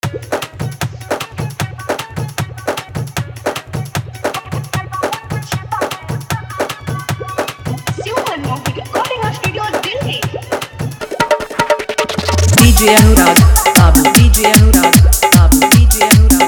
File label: New Viral Bhojpuri Dj Song Hard Dhollki Bass Mix